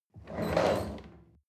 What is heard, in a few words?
chair wheel roll on floor medium squeak